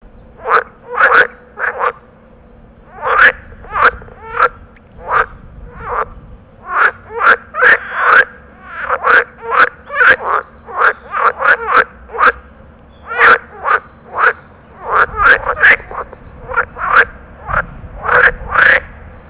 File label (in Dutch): groene kikker territoriumroep